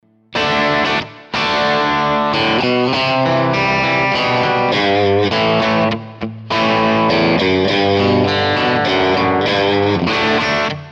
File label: 59M NOS Crunch Bridge Pickup; Danelectro